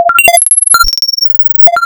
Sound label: Simple Periodic, wavetables; Architecture 32